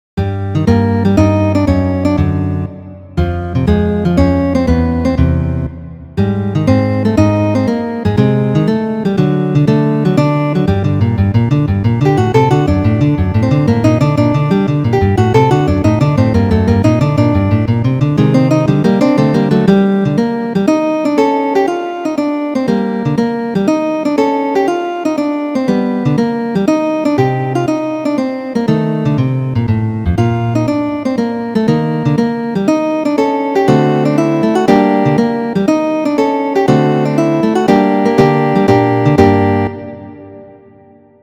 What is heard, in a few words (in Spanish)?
guitarra duos; Ferdinando Carulli